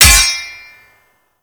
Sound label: parry sword; Warframe